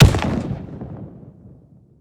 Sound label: stike impact; weapons; dota; monkey king